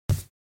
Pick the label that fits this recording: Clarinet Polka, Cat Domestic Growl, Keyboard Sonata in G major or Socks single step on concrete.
Socks single step on concrete